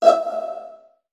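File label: viola pizzicato E2 right, Virtuoso Strings; ILIO; Kirk Hunter Virtuoso Strings VOL-2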